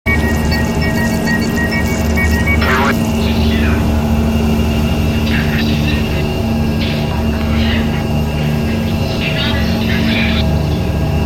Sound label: noise level